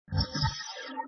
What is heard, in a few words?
Menu Popup; Windows; Whistler